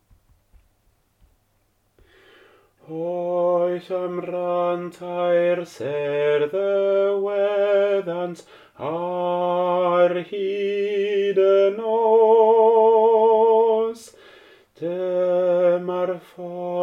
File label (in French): tenor